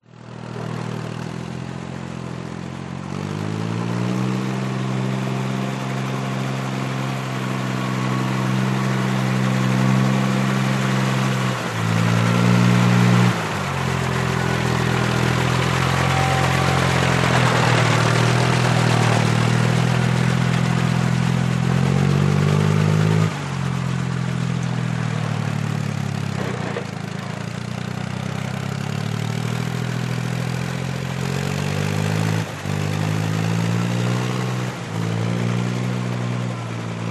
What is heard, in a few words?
Kubota Tractor Working In Ricefield